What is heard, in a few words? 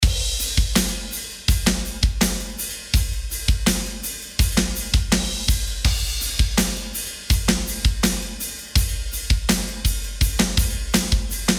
Drum Bus